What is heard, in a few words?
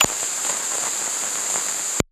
radio transmission interference noise transmitter; Interception; Bluezone